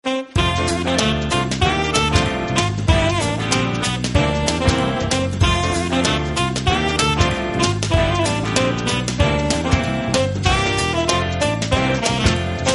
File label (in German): FRANKENLIED Lied der Franken Swing